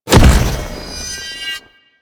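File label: Quick Draw Projectile Land; Enemies; Weapons; Warframe; Corpus; Arachnoid Camper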